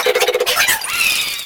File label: Fan Chant; Mario Paint